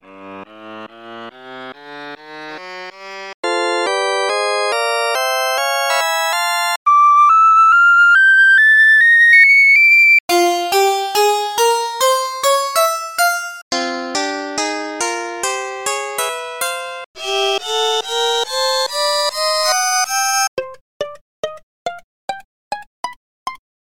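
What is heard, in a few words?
C flat harmonic minor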